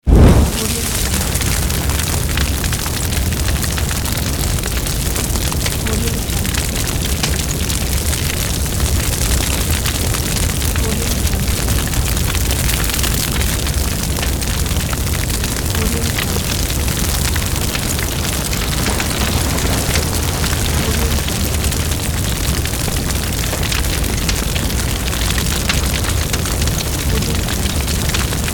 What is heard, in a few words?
fire